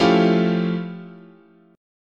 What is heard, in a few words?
F Augmented Major 9th, chord